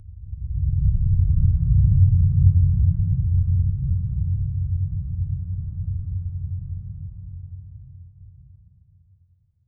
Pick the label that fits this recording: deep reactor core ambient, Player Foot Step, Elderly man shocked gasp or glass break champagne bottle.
deep reactor core ambient